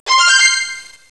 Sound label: rimshot; MOBICLIC41